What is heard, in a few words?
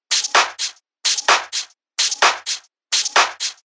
128 BPM